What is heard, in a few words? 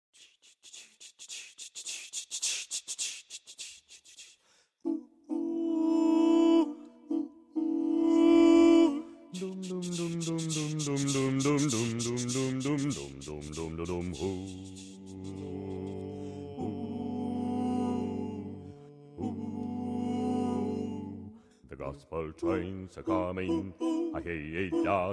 voice quartet gospel train